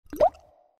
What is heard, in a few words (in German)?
blubb